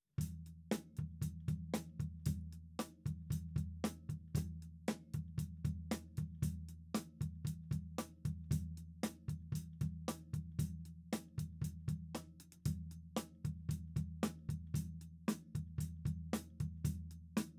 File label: Toms